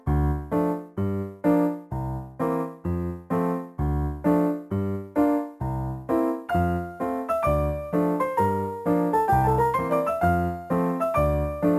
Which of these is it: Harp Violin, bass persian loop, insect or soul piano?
soul piano